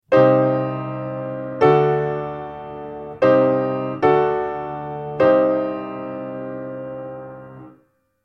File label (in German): akkordfolgen